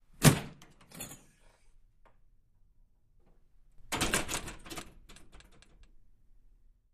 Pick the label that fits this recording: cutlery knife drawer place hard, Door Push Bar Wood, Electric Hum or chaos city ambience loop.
Door Push Bar Wood